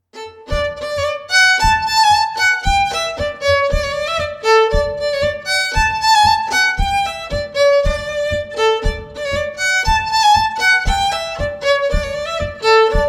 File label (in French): Bourree de la montagne; Recueil Hainl